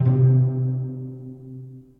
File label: Resonant Pizzicato Strings, multisampled; Ableton; Mood Reel; Placid Plucks